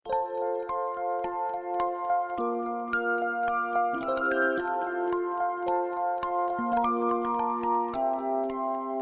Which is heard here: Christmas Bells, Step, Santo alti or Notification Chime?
Christmas Bells